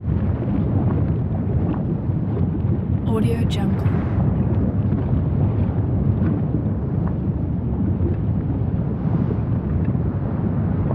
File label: volcano lava; prosoundfx